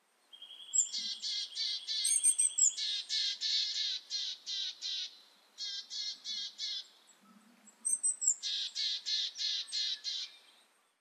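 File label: willow tit; kogara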